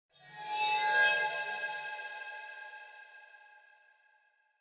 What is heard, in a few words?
Horror Accent